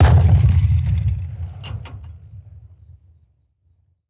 tools tankgun

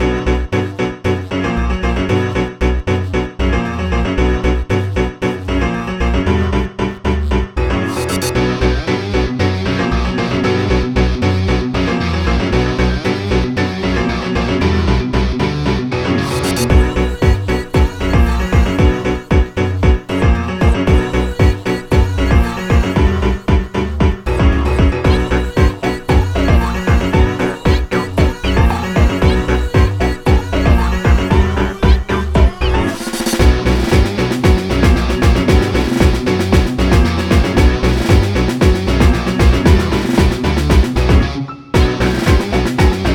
Instrumental; Friday Night Funkin Funkin Peanuts; Junkyard

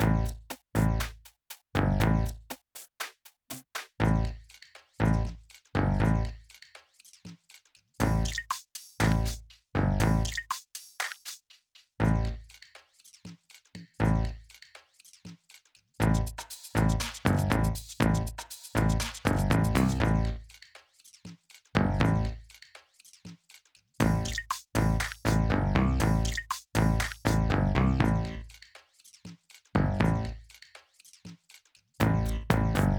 music basement; Lootlite; Xbox Series X/S

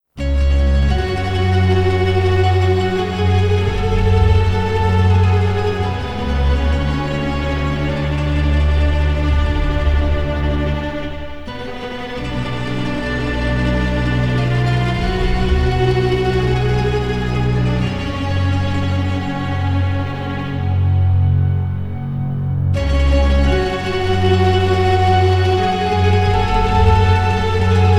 Music Italy; rise of venice windows gamerip 2013

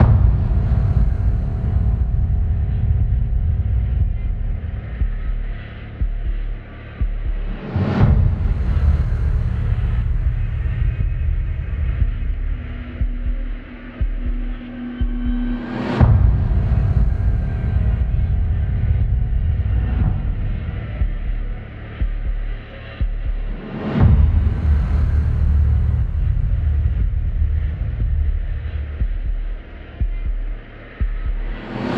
Rising Danger LOOP; unrest indigo

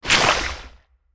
Sticky slime End; Skill Curse Stickyslime End

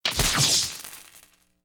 light spark

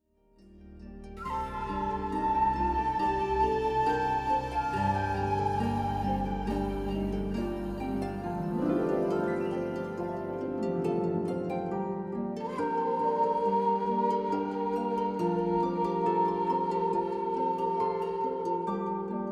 Instrumental; Baldur's Gate 3

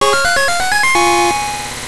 Stage Clear; Mega Man II; Rockman World 2; GB

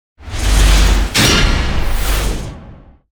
Duel Start

